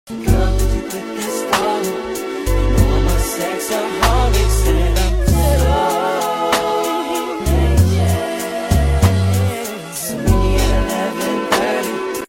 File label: Slow Soft English Music Ringtone